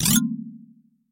Menu Open

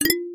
Switching Screens

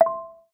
Check Box Select

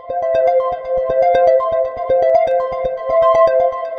space arp loop; ohms-law; potential energy

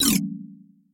Menu Close

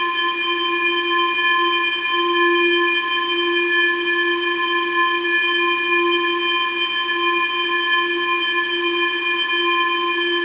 Whistle; phet-io-wrapper-sonification; gravity-force-lab-basics

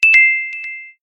electron drop; build-an-atom

